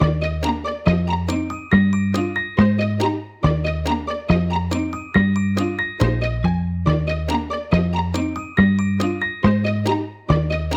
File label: Pizzicato, ringtones; LG Fortune M153 Cricket